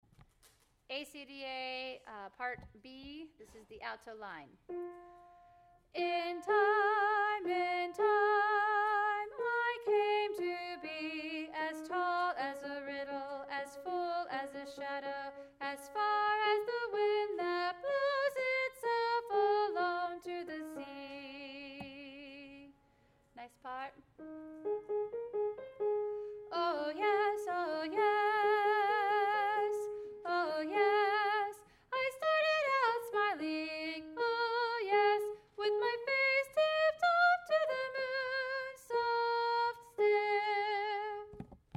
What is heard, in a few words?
alto